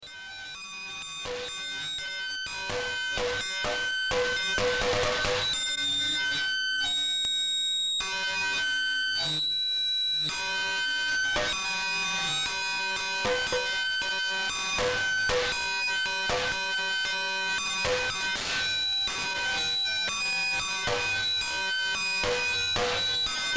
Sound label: perc